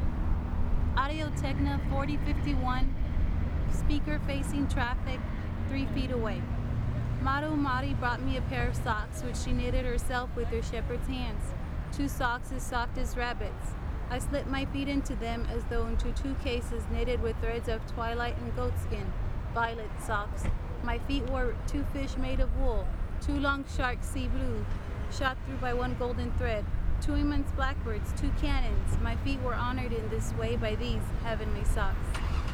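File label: AT4051 3ft Speaker Facing Traffic, Exterior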